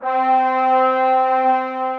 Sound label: Brass Wash, bold brass; Instruments; Ableton; Factory Packs; Mood Reel